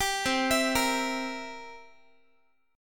C 7th C down Guitar 4A, 4E, 5A, 5D, 5G sharp, 6C sharp; C7 arpeggiated